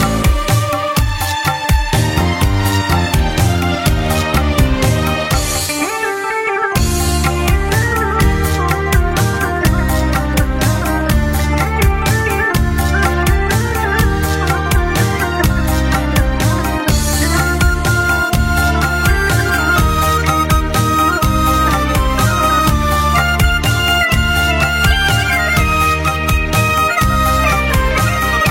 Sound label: Melody